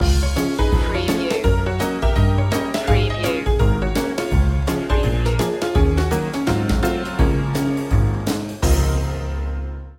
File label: ragtime promenade